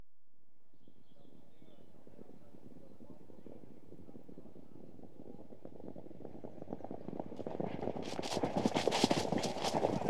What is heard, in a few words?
Point TO Point Racing, Field Pass, Equestrian Events; BBC Sound Effects Library